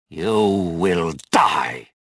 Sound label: anger; reborn